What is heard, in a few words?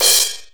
finish short; osu